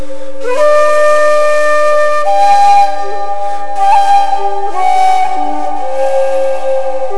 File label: Flute